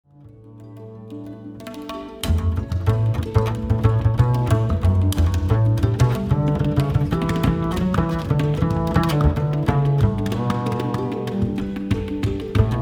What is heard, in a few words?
bass solo; spring